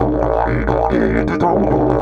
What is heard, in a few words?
120, Didgeridoo